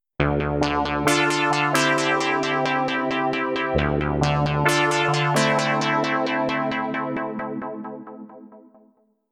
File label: Pulsator